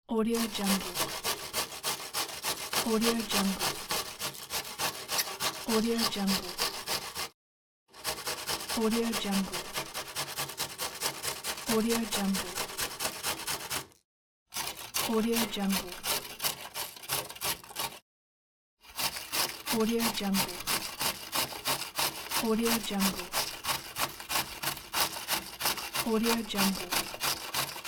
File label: grating food